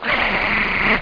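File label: rabbit attack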